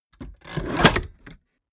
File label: mechanics small snap